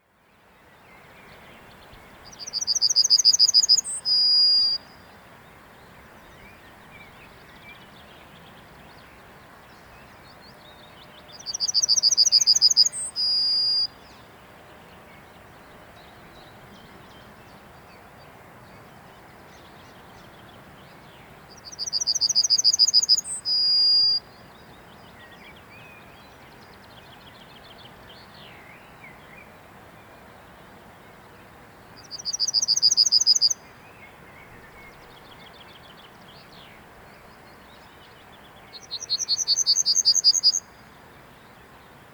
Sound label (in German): Goldammer; Garching M; Daniel Honold